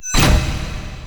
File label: Stairs; Warframe; Entrati; Vaults